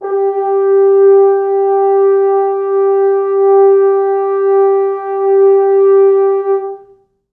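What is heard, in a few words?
4 French horns sustain G3; East West; Quantum Leap Horns Sax; 4 FrHorns SUS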